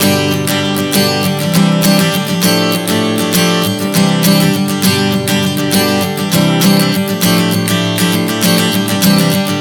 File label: G-Cadd9-F69 100 bpm, acoustic guitar chord progressions